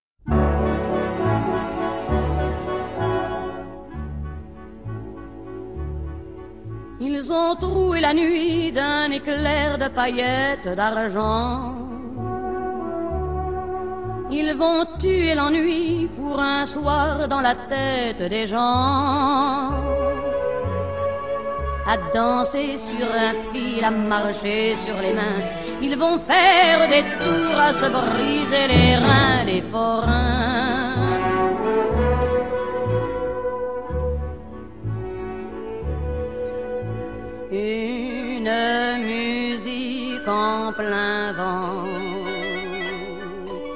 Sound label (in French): chanson